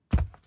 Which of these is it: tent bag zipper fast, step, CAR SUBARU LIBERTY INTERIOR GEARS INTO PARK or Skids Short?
step